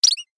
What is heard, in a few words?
Spring Time Sound